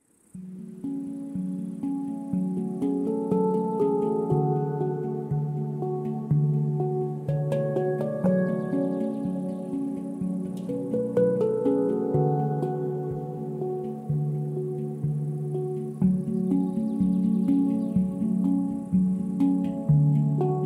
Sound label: app pad